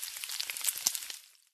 cooking; STALKER; IWP

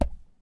Shell 39mm sand; STALKER; IWP

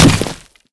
concrete, bullet collide; STALKER; IWP

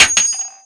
shell heavy metal; STALKER; IWP

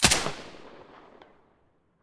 silencer mono

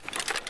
sprint start pkm; STALKER; IWP

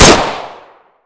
snag shoot, weapons; fort

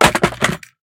riffle drop wood; STALKER; IWP

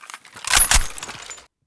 misfire, weapons

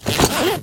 inv close; STALKER; IWP

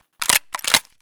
mossberg misfire

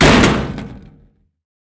riffle drop metal thin; STALKER; IWP

